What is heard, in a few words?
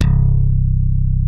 DYNA MID C2, DIGITAL BASS; Music Store - Claudius Diemeter Schwerlos